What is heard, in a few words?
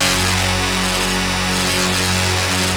basses; Wizoo - Powered Wave